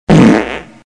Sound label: Fart Huge